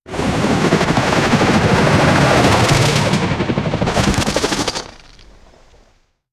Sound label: helicopter hovering prope; cruise ship